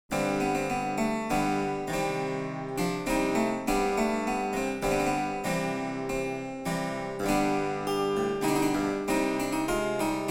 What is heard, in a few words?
German Harpsichord Front 8; 1741; Excerpt from My Ladye Nevels Grownde by William Byrd; edition organeum